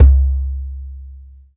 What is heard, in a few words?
DJEM SUPER, African Drum; E-MU Producer Series Vol. 7 – Old World Instruments